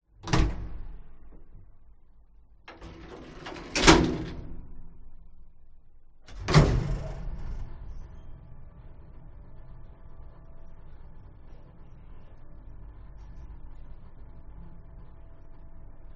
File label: elevator reverberant old Portugal Porto